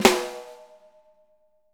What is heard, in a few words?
6X12 ALUMNUM, Living Drums; Sampleheads; Peter Erskine